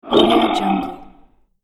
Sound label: dragon like beast